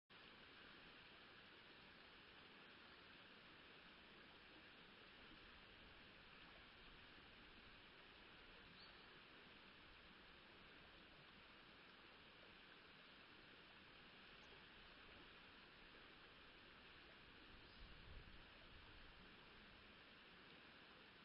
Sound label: riverside